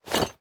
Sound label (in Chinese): 装备铁质盔甲声